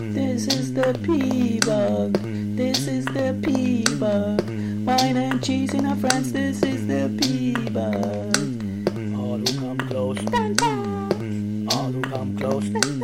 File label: beatbox